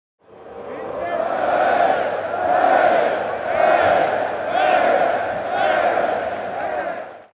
Stadion Atmosphaere

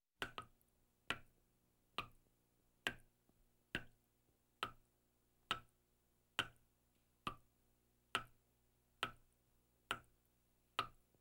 In Der Kueche Tropfendes Wasser

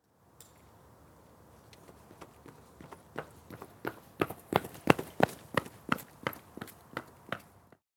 laufen

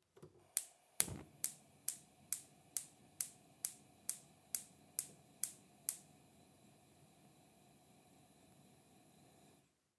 Gasherd

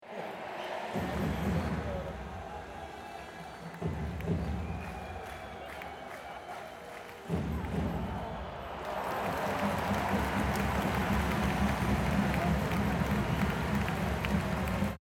Handball Hallen Spiel Atmosphaere

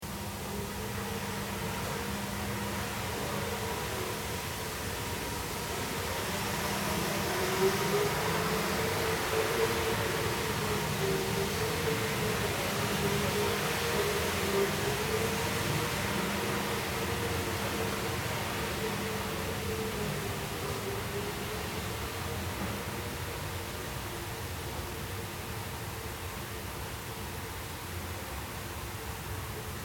Reinigungsmaschine

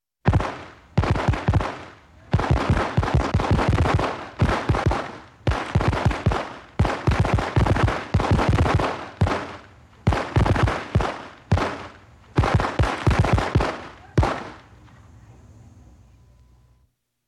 Feste Feiern Feuerwerk Grosser Kracher

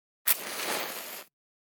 Streichholz

